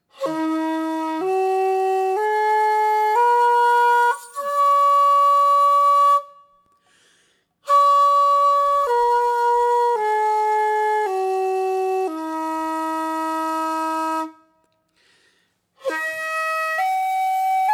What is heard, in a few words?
Basic Tones